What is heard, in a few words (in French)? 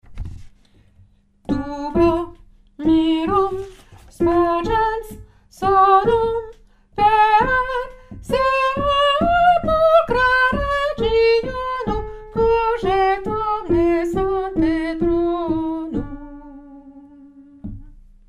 numero 2 lettre D soprano